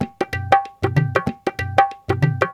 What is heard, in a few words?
Tabla; loops